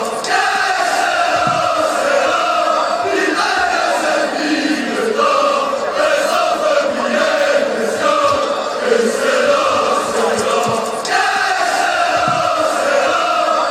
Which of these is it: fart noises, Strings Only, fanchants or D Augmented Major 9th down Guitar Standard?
fanchants